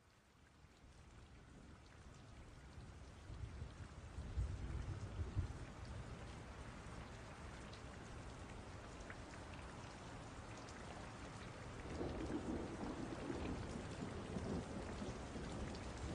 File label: ambient rainy city jazz